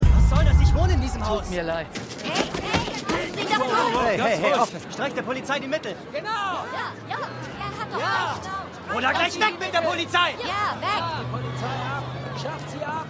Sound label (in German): Bewohner Dumme Menge Schaulustige